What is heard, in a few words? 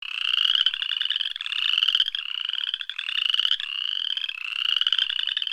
Chorus Frog; stereo